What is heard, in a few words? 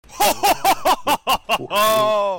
hohohoho